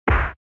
SNES Fighting Hit

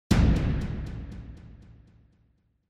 Onoma Impact

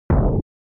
SNES Shooter Bomb

